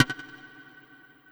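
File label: PERCUSSION, 160 BPM; Ueberschall; Drum & Bass Flash Trackz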